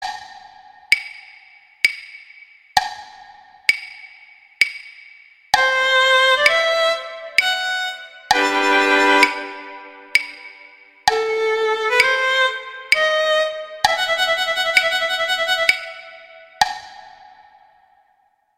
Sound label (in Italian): Introduzione Guitar; Paganini; Sonatas Op1 Vl Gu; Sonata No 4